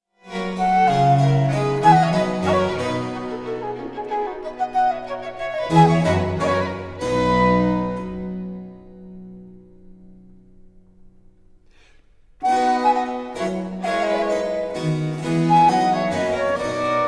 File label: quartet no 5 for flute 2 violins and basso continuo; Francois Andre Danican Philidor